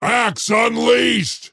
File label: attack pain; axe